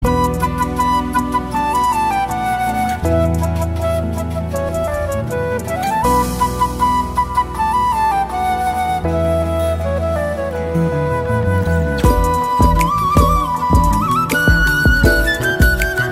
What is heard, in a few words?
flute ringtone